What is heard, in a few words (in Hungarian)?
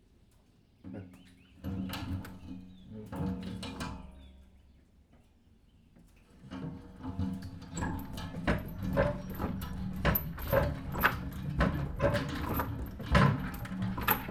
vizhuzas, viz visszaontese, kerekes kut felul zart; vízház; cardioid